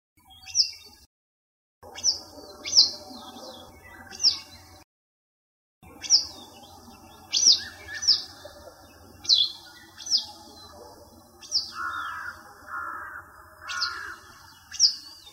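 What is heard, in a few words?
western yellow wagtail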